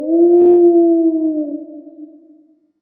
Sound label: Wolf Howl; Night scenes